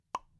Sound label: character tap; Cozypaw-Hospital